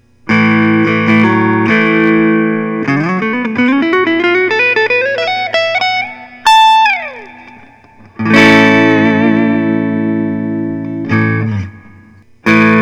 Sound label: gibson custom les paul axcess standard, Guitars Electric Solid Body